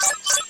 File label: Chest-Item Ui; Superbrawl; Nickelodeon Super Brawl Universe; Android